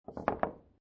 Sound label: Billiard Pool Snooker Put Several Balls On Table; Sound Effects:Sports; Lynne Music